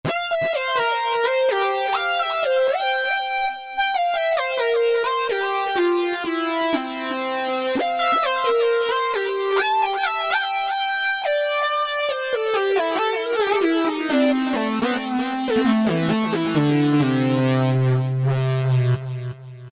Weepy Lead, Korg microKORG; Factory Voices

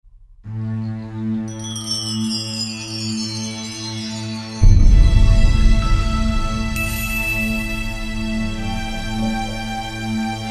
Korg TR-Rack Combi; Delayed Reaction

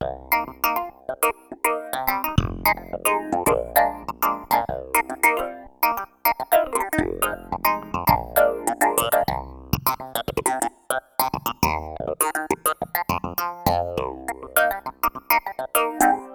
Throaty Clav, Roland JD-800; Factory Patches

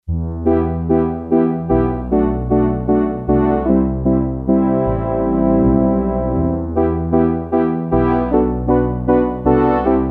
Ensemble, Yamaha TX802; Factory Patches; Voices

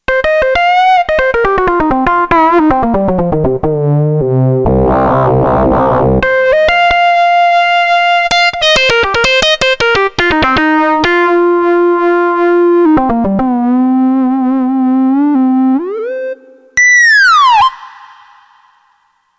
DC Lead, Kurzweil K2500X; Factory Programs

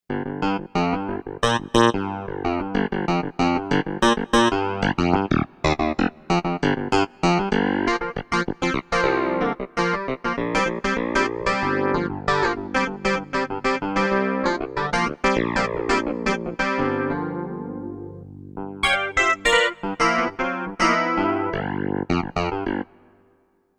Clavinet, Korg M1Rex; Factory Patches

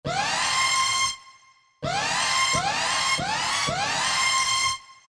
Signal, Yamaha DJX; Voices

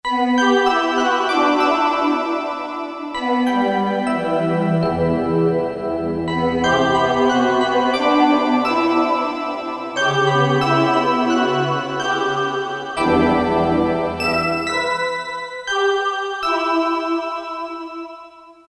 Chime Ah; KSDU-013 Combined Groups

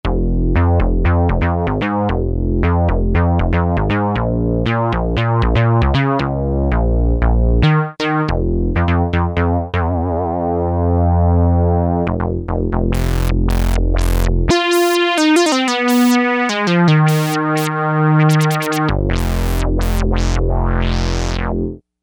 Bass

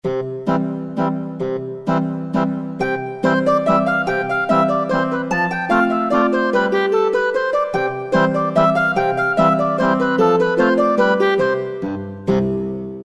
Madrigal, Korg N364; Factory Combi demos

